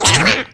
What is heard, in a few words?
dark bum payout